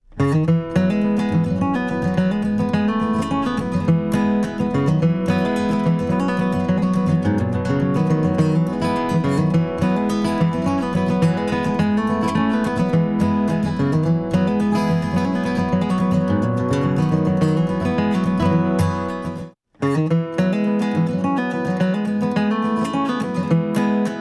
guitar low cut filter; audio examples from the book

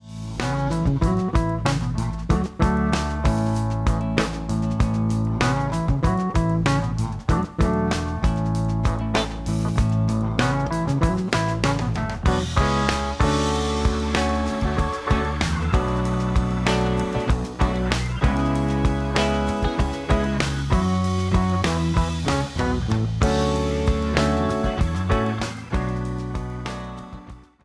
drums good; That’s more like it; Mixing for God audio examples

